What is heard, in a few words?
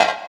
PERC, Drum & Bass